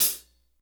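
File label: hi-hat close, acoustic set; drum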